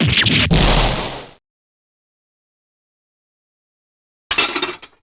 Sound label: breakdown